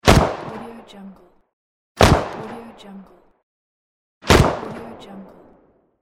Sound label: gun shot